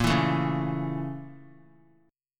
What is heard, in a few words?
A sharp Minor Major 7th Flat 5th, down, Guitar; strummed; 4F,4A,5C,5G,5C,6E; JGuitar